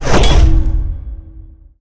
ui message popup fast

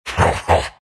big laugh; dota; undying

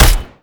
attack; dota; omniknight

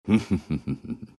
laugh; dota; chen

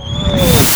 assasinate projectile, sniper; weapons

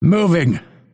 move pain; dota; phantom assassin

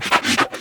sniff; weapons; dota; creep; roshan

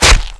demon attack; warlock

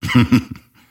grimstroke laugh; dota

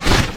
nightstalker movement; physics

